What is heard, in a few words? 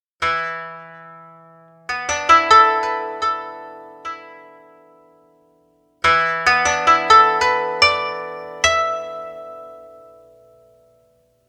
koto